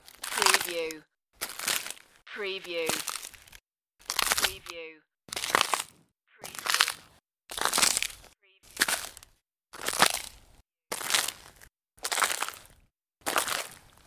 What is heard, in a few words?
Snow and Ice, Footsteps ice